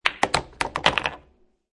Billiard Pool Snooker Ball Hits Other Ball Which Falls In Pocket; Pool Sound Effects; Lynne Music